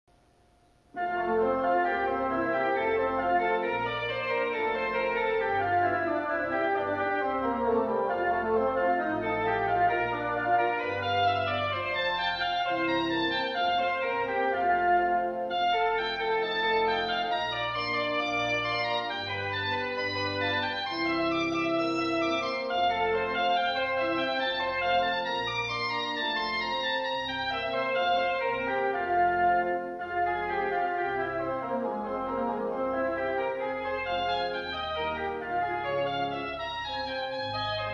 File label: Praise Him With Flute; Offertories